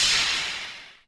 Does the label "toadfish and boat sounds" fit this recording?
No